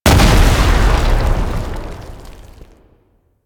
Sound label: Tank Infested Swipe Impact; Enemies; Abilities; Warframe; Lasrian